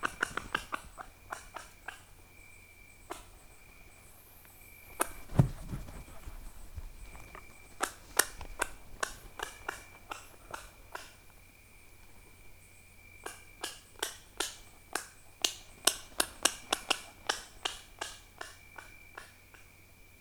guacharo des cavernes, chant

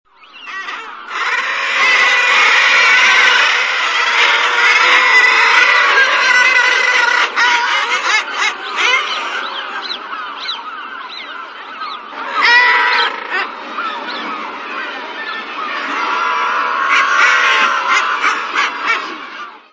guillemot de troil, chant

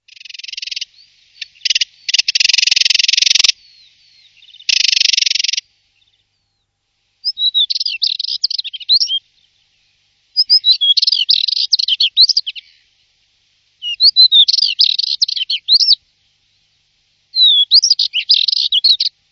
fauvette a lunettes, chant

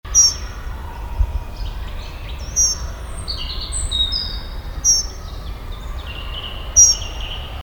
accenteur mouchet, chant

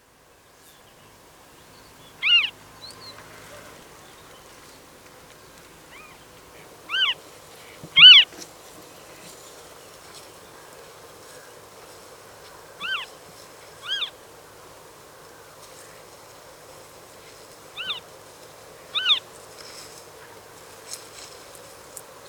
busard des roseaux, chant

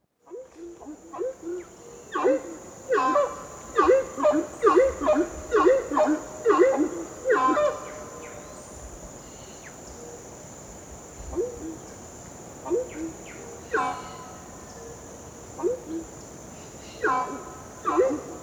kamichi cornu, chant

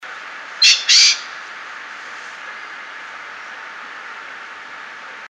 faisan dore, chant

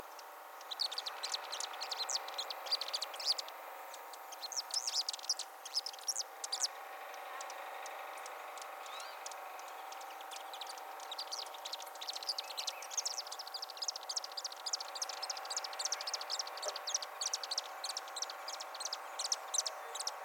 martinet polioure, chant

